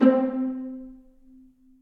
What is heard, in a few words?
Viola Section Pizzicato; Ableton; Orchestral Strings